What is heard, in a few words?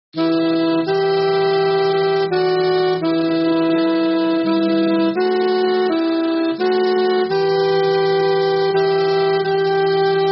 Alto